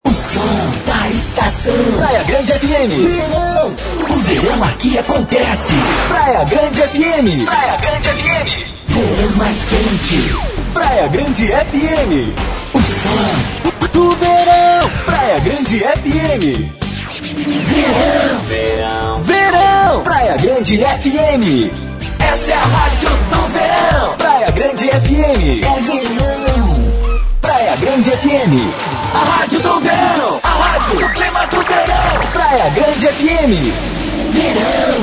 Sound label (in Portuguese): vinhetas verao